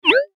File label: tag; siropu chat